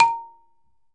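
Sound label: BALAFON, Pitched Percussion; E-MU Producer Series Vol. 7 – Old World Instruments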